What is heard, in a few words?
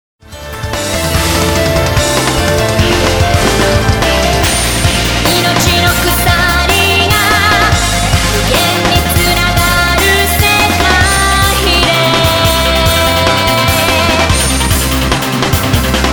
Pops